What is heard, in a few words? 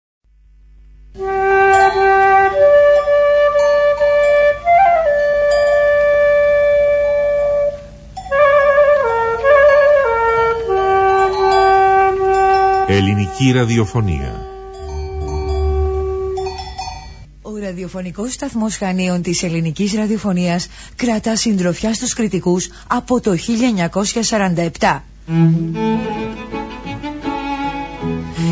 interval signal; era chania